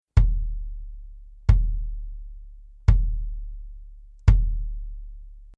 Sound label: BASS Soft Felt